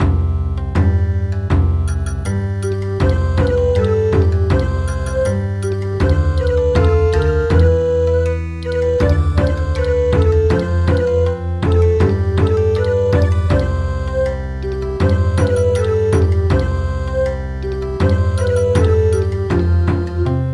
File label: Bon Odori; The wooden clogs